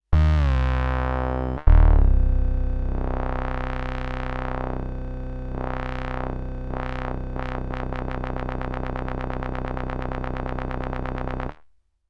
wersi bass all sections

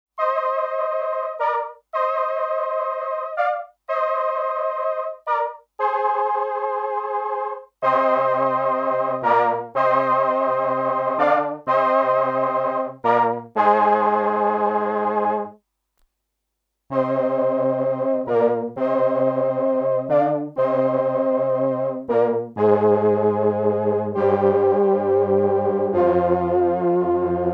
Chorus, korg pe2000

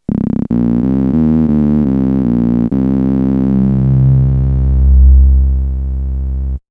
moog satellite open braas

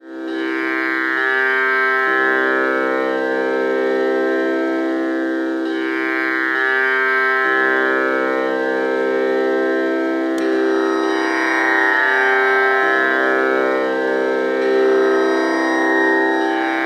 digital raagini

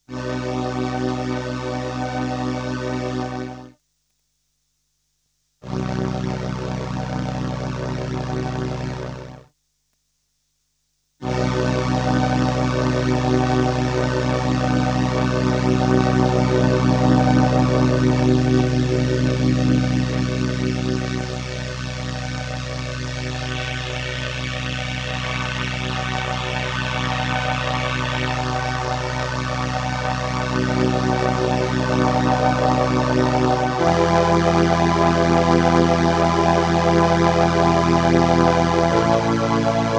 crumar performer strings

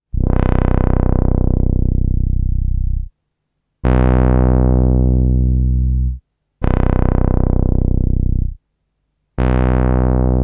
crumar performer brass

